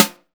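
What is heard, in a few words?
SNAPIN SNARE; Drums In Your FACE!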